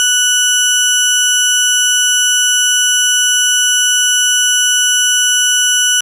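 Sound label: FM Sawtooth DX100 F#6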